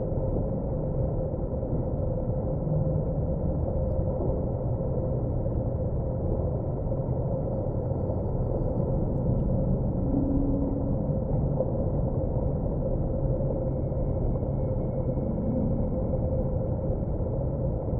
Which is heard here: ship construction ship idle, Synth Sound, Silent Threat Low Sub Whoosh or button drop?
ship construction ship idle